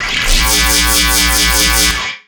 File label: zap